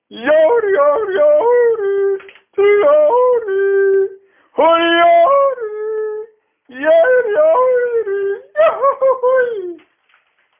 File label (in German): Jodler